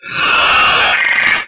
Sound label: Gallimimus